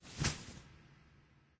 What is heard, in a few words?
cloth; smite